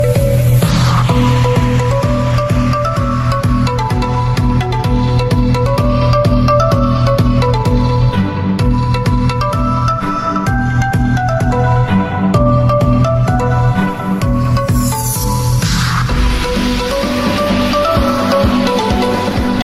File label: english music ringtone